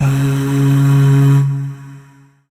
Void Shawzin Oct One C Sharp; Warframe; Zariman

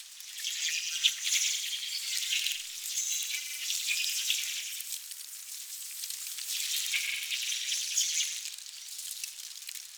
Landscape Insect Loop, Stereo

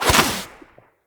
Bow Shot; Weapons; Dendrit Bow

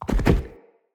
Stag Stampede Gallop; Powers

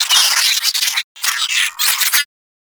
space drone enemy spotted; Warframe; Corpus Space Drone

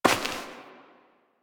Step Dirt; Warframe; Koumei

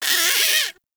Forest Rodent Vocal Angry Short; Vocals; Warframe; Characters

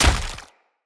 Husk Impact Flesh, Unarmed; Weapon Impacts; Enemy; Mockery Husk

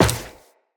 Run Metal, Footsteps; Tenno

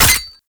Pistol Alt Fire Charge Full; Weapons; Scaldra

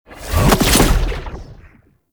Teleport Disappear; Enemies; Abilities; Warframe; Tau; Dax Ninja

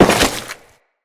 Crewman Step Goo, Footsteps; Enemies; Corpus

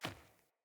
Sandals Run Dirt, Footsteps; Player

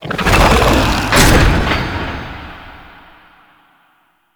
Sarcophagus Spawn; Warframe; Mummy

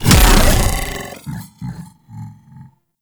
Devour Regurgitate Impact; Warframe; Grendel; Grendel Deluxe

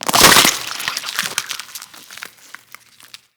Container Open; Nano Spore; StorageContainer; Material Specific Loot Containers

